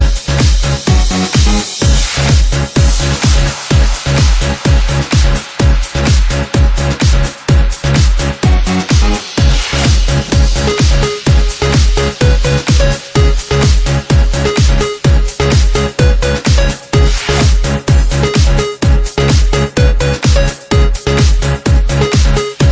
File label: instrumental radio edit, disco